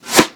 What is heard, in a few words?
snare, Drums, One Shots; apex; Slate; AURORA Sample Pack; Snares